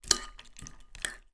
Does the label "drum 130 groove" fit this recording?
No